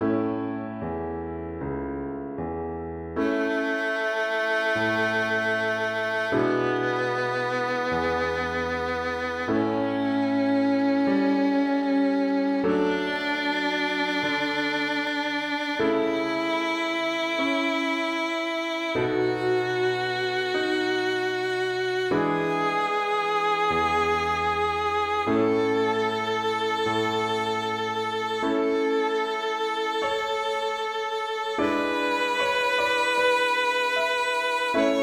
The A Major Scale, violin; violinmethod

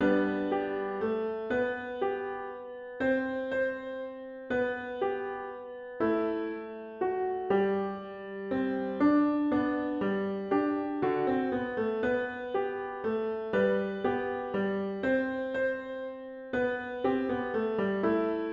Bach Minuet, 바이올린; 바흐 미뉴에트

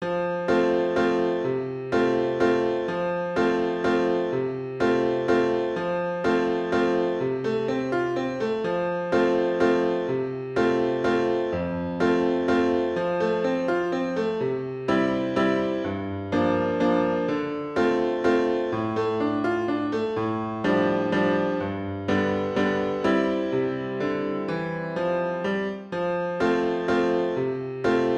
mio babbino caro; puccini giacomo; 푸치니의 잔니 스키키오페라 중 '오, 사랑하는 나의 아버지'

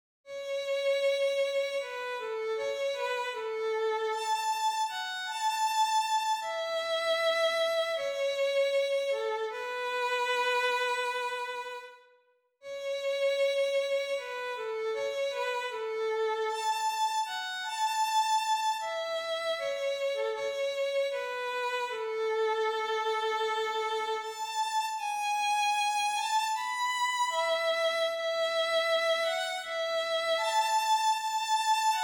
OLD FOLKS AT HOME, 바이올린; violinmethod

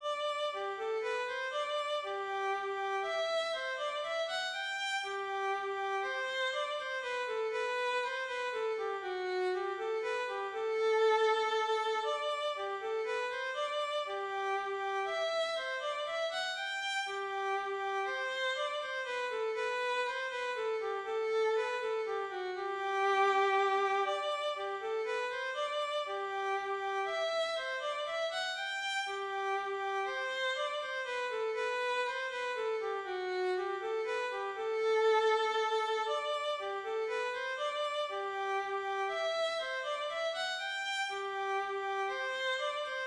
Bach Minuet violin; 바흐 미뉴에트

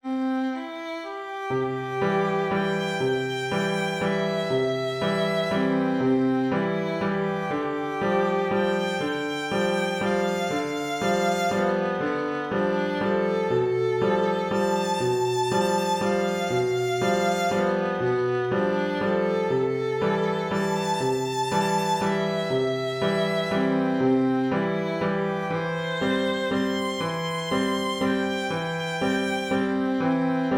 the blue danube accomp, violin; 요한 슈트라우스의 '아름답고 푸른 도나우 강'

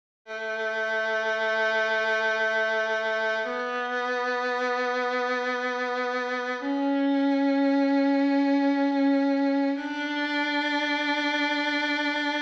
The A Major Scale, 바이올린; violinmethod

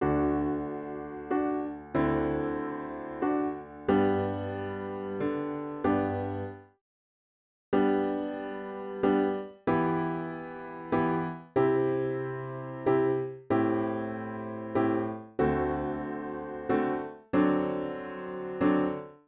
I WASH MY FACE piano; Children's Song; violinmethod

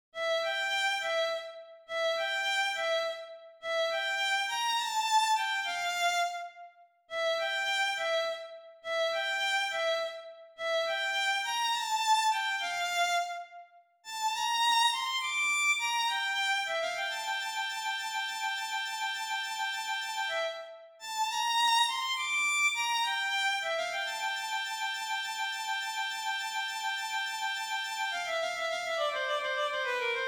melodic etudes vn; heller stephen

